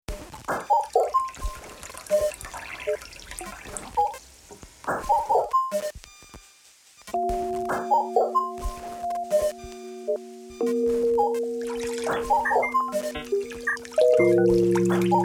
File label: water fall; おかえりモネ; オリジナル・サウンドトラック 第２集